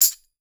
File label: TRASHY, TAMBOURINES; Voice Crystal - Steve Reid Definitive Percussion